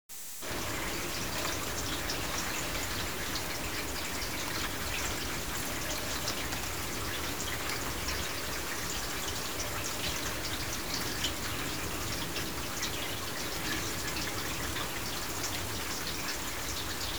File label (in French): Eau coule; Resurgence